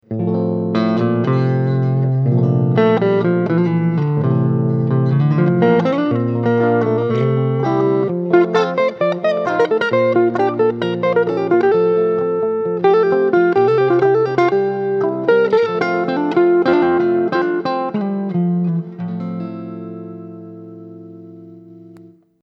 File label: rautalanka strato